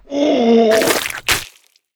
Goblin Leader Die; Creatures

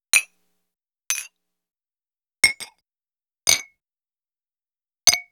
Tavern Clinking Glasses; Effects

